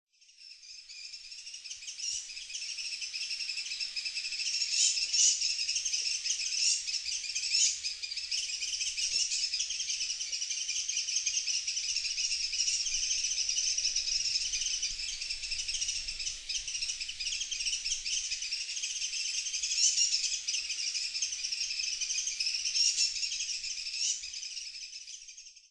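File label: Japanese White-eye; mejiro; fukuoka; koe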